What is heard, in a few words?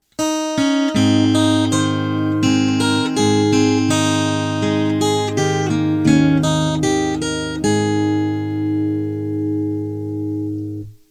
jr chime